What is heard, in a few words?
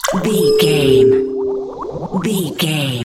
potion heal flask spell